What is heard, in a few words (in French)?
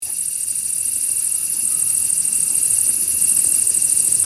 sauterelle